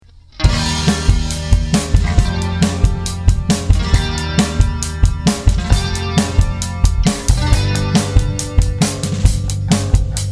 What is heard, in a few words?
instrumental